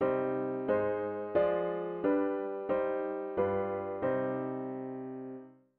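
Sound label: diatonic inverted V7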